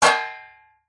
impact metal tray tin hit blunt med pitched resonate ring